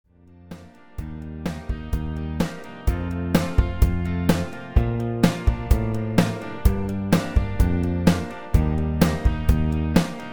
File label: Sampler NV